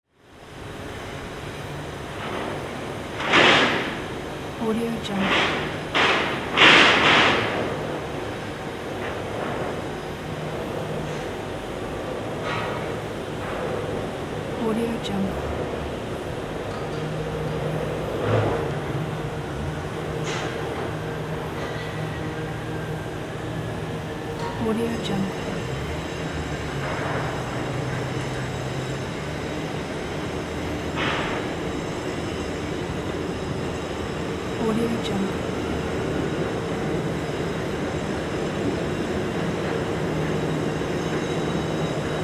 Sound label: shipyard ambience